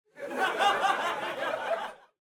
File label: WALLA - Crowd laugh and shout - Interior - Medium; Timothy McHugh; Crowd Laughter